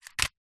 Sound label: foley stones very small in tin set down ground; zapsplat